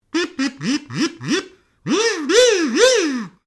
KAZOO VOCALISATION STEREO, Playing around on a Kazoo; Cartoon Sound Design